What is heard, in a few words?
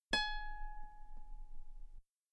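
guitar G sharp 3 very long forte normal; bananenkeyboard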